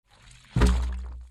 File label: slime out the chute